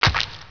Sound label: chum toad step